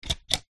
household cupboard door latch antique movement open; zapsplat